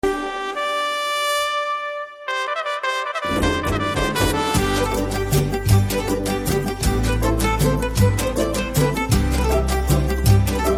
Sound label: Instrumental Ringtone; Care Of Kancharapalem BGM